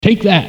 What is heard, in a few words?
Excitement, Vocals, bowl